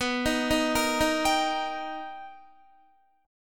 B Augmented Major 7th B Guitar, chord; B+M7; arpeggiated; JGuitar

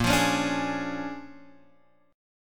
A sharp 7th Sharp 9th, guitar chord; A#7#9 Chord {6 X 3 6 3 4} strummed

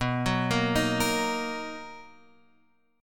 B Major 7th B down Guitar Open F; arpeggiated; JGuitar

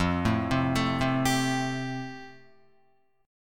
F Major Add 9th down, Fadd9 chord, Guitar; arpeggiated; JGuitar

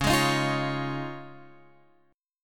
Db Major Add 9th, Guitar, Open F; strummed; JGuitar

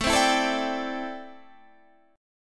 A Minor 11th A, Chord

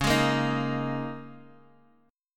D sharp 7th Suspended 4th C sharp, Guitar

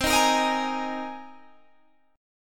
C Minor 7th Sharp 5th, Guitar, Standard; strummed; JGuitar

